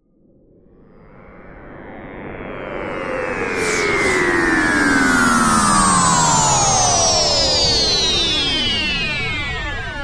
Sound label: Cosmic Waves, Scifi, Cosmic, Waves, Science, Fiction; SoundEffects-BlastWave